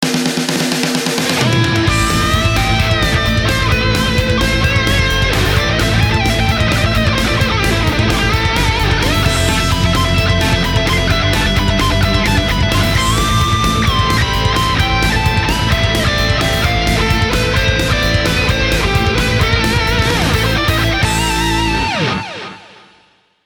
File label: Result rock